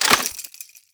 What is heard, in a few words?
mag out full, weapons; cw m249